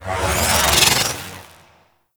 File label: Teleport; Warframes; Loki